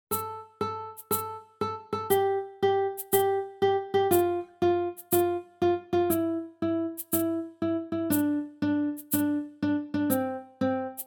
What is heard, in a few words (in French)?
Canon instrumental